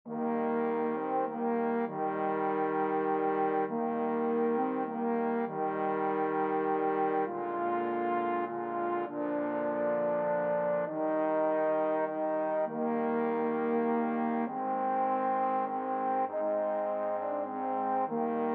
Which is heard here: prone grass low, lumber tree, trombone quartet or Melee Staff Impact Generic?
trombone quartet